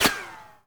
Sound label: bullet fly by